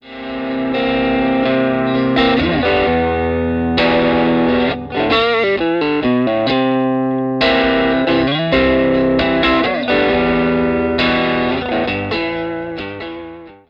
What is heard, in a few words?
TELE NECK OVERDRIVE; t neck pickup; PRINCE OF TONE